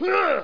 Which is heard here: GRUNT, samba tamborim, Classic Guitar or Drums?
GRUNT